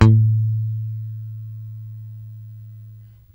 ROCK, PICK BASS

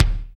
BD, BD Dance; Sound & Vision; Gigapack I CD 1

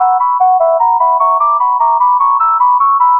Flutey High Cycle, Synthetic Pulses

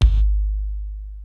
FUNK, DRUMS; Kid Nepro; Elite

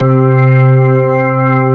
ORGAN C2

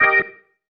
Stab One Shots, Organ

